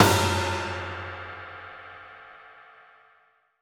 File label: Percussion Hit